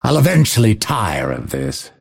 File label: deny pain; dota; phantom assassin